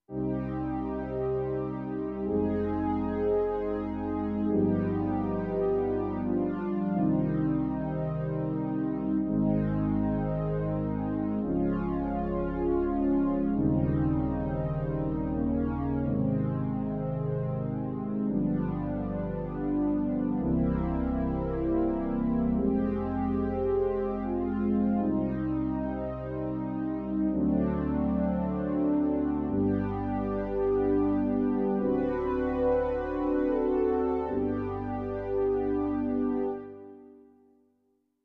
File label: Horn Pad